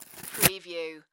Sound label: Cardboard Rip Short